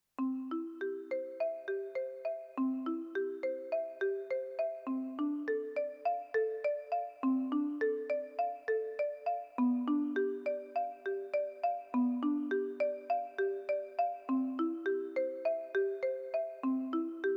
Marimba

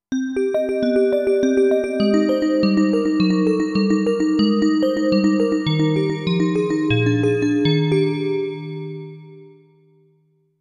Digital Bells

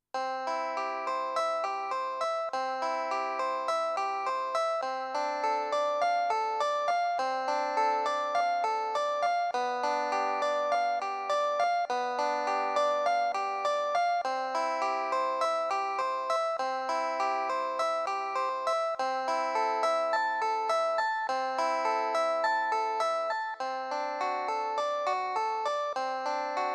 EClavichord

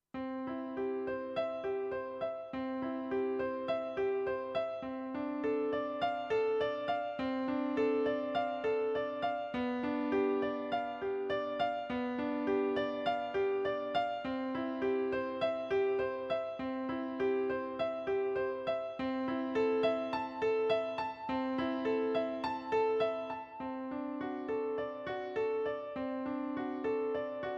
Bright Piano

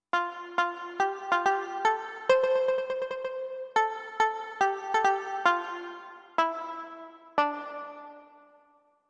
Muted Banjo

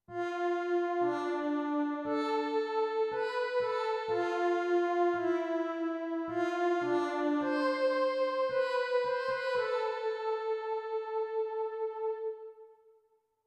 Metallic Pad

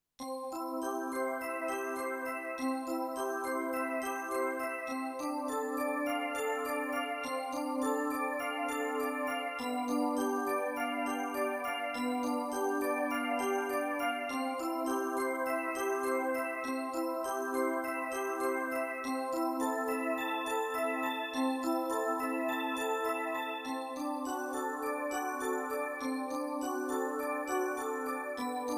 Synth Piano

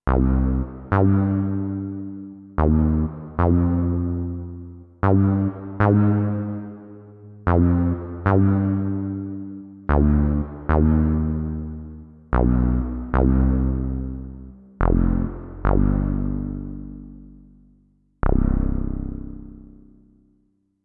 Fast Reso Bass

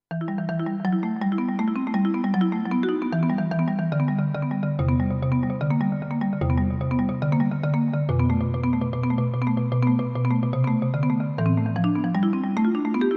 Marimba

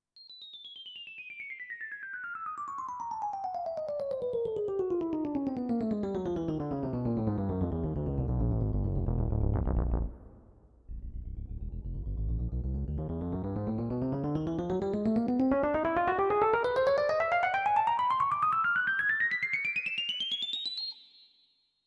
E piano

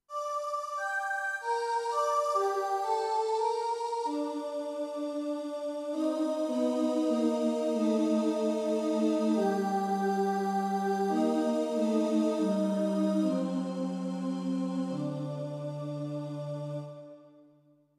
Synth Voice

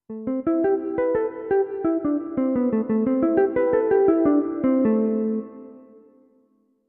Mellow Guitar

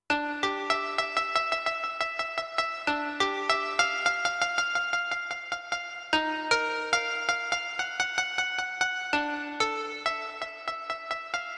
Koto

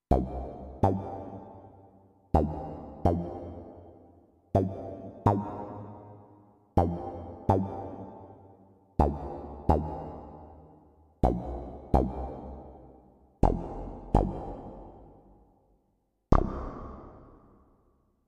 Techno Bass

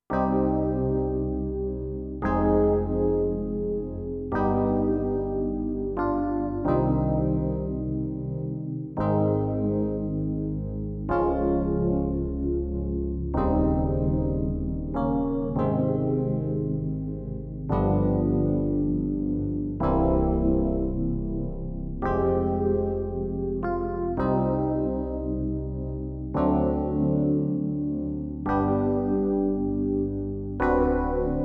DX Phase EP

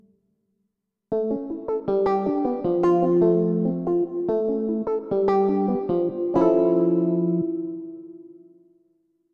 Jazz Man

